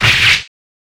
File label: sword kill